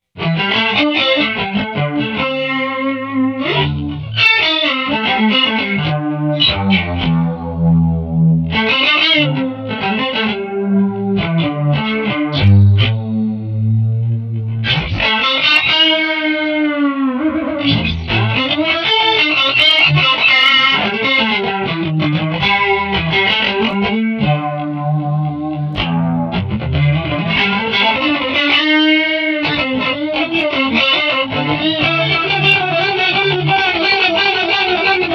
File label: Chorus